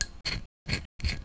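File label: silencer attach, weapons pistol; KINGPIN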